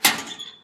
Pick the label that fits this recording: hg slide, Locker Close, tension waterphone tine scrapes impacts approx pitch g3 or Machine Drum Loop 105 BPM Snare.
Locker Close